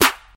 airy steel snare drum sound f key, steel snare drum tuned to the F note; snare-drum-samples